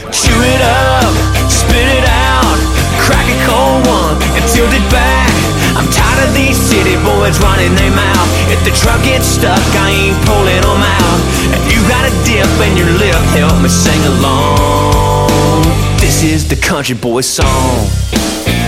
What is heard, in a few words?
Country Song